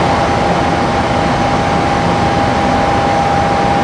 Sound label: Jetpack Hairdryer Loop; Rabbids Big Bang; Android iOS Windows gamerip 2013